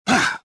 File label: gasp; Star Wars Jedi Knight II Jedi Outcast; XBOX; lando